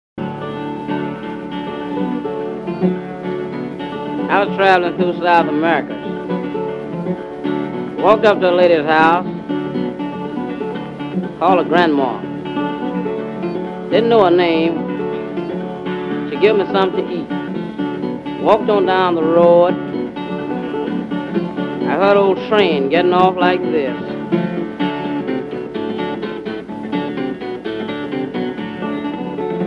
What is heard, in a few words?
Slide Guitar, Travelin' blues